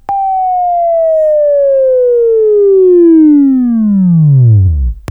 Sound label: Buzz; Labview Program; Sweeping Training program